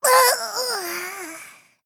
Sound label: Death